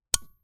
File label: lamp off